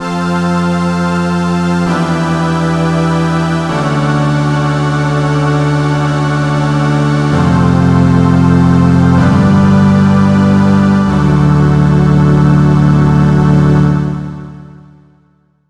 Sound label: Classic Str Machine Full On, string machines; electrickeys